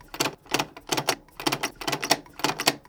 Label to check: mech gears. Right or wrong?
Right